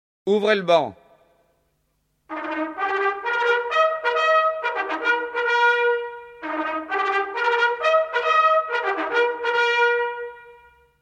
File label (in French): trompette de cavalerie; ouvrez le ban